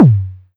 Tom High DMX; One Shots; Drums

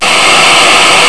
crackle; AngelTearsv3; AndromedaSky

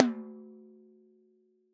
Tom 8 Inch, Drums; One Shots

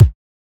Kick Flat Noise

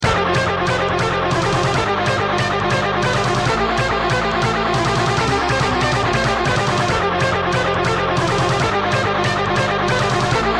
Music Tower; Megaman Flash

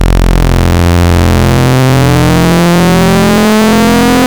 Sync Digital; Ableton Live 12 Lite; Vector; Sprites

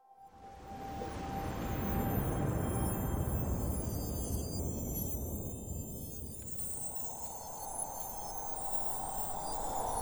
Symbol Rain; Ruins End Quad; Journey

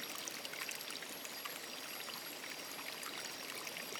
sparkle water loop; background

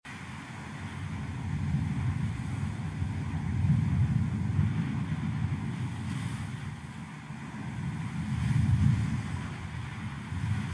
Desert Sand Tower Swirly Sand; Journey

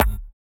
E-Perc Tuned Block Low, Electronic Percussion; Drums; Ableton Live 12 Lite; Core Library

